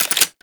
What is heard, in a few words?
Laser Aim Rifle Auto Switch; Warframe; Grineer